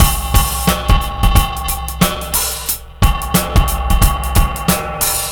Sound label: drm 090, Breakbeat, 090bpm; Synthi Strut